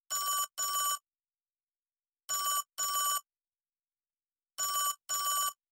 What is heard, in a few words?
old skool tele-ring